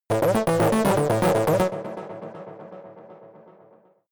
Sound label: shot action mission distorted